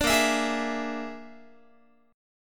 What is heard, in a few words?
Bb 7th Flat 5th Bb, Guitar; Bb7b5 strummed